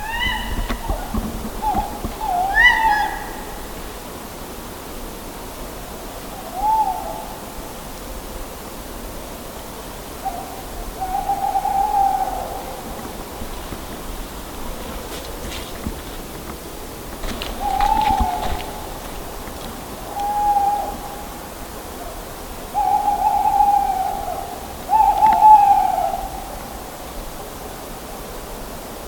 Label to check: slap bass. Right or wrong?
Wrong